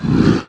Hedgehog attack

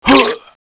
hit; Eft; Gold Beetle

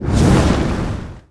magic fireball fire

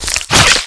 Locust Attack, game; Eft

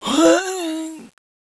sick tiger die; Eft

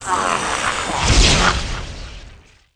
Bone Tyranno ATTACK, game; Eft

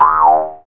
Attack; Eft; Triplet Mask

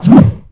Raccoon Attack

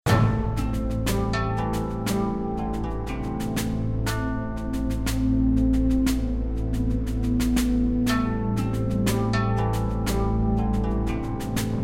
Music Ancient Tomb

